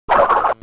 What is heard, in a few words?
crash spin; Memes